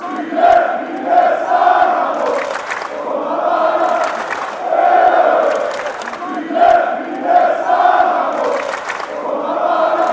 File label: chant, fanchants; olympiacos